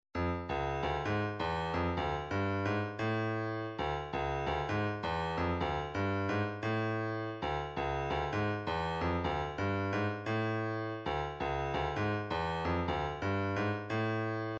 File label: 132 snare